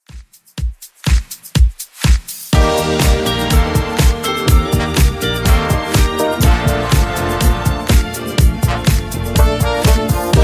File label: 123bpm